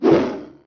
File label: wildcat hit; Aleria